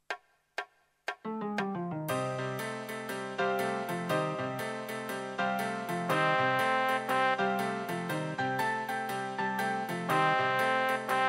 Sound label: Blues